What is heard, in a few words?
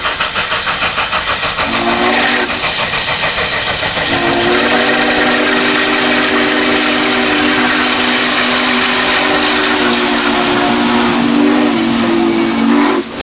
steam